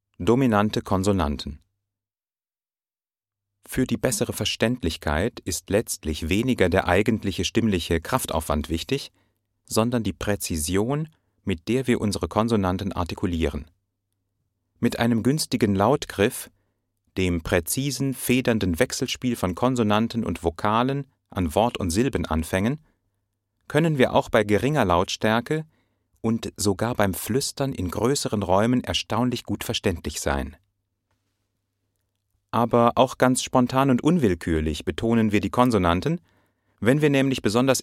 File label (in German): Dominante Konsonanten